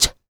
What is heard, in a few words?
FEM PERC, Vocal Jazz FX